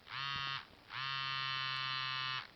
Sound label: motorola razr v3 ringtones vibe dot dash